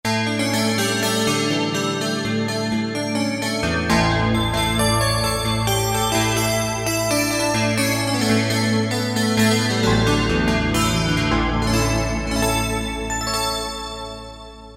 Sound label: PC Glass, Yamaha TG77; Factory Presets